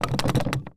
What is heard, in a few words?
Suitcase Handle Rattle, Household